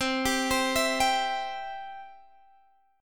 C Major, Guitar Half Step Down

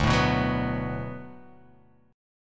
D 6th D down, chord

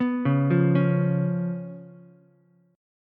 B Suspended 2nd Flat 5th B down Ukulele; arpeggiated; JGuitar

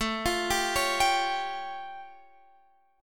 A Augmented 7th A; x,x,19,22,19,20,21,x

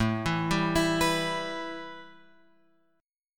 A 7th Suspended 2nd; A7sus2 Chord {5 7 5 X 5 7} arpeggiated

